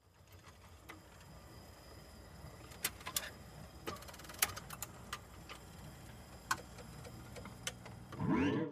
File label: Jukebox Cu W Coin Inse